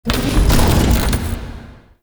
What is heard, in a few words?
UI Mod Activate Pilot Weapon; Warframe; Railjack; Player Ship